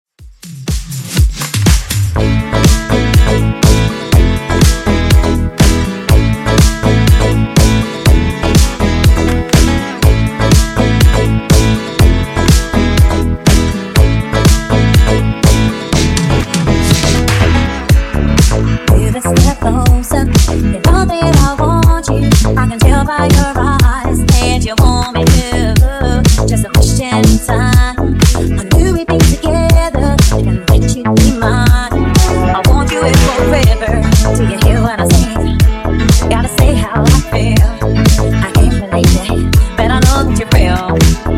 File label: Funk 122 Bpm